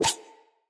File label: Dagger Whoosh Heavy; Weapons; Enemy; Daggers